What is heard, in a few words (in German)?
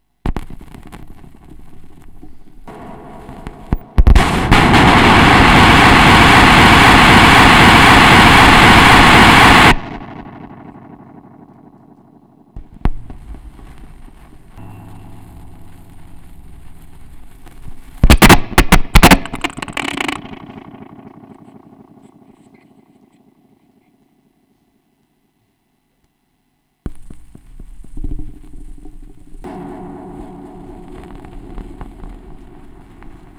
schallplattengeraeusche, Vinyl